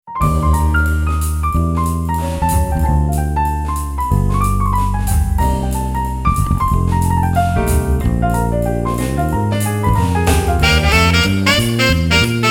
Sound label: jazz, jazz fusion